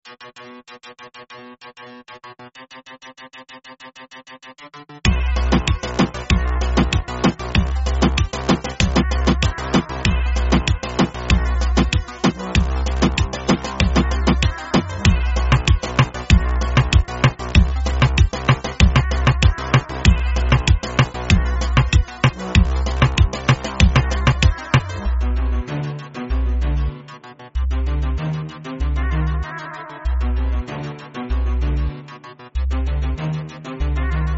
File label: Reggaeton